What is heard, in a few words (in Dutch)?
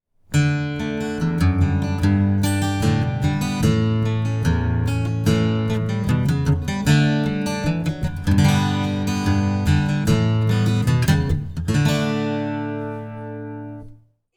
Gitaar; Luistertuin